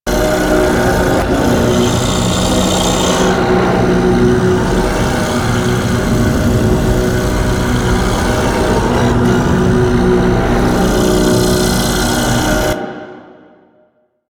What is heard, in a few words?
Octopede Unholy Ground Loop; Warframe; Man In The Wall